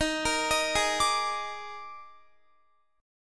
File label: Eb Major 7th Suspended 4th, Guitar; EbM7sus4 arpeggiated